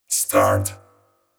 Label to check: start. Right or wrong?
Right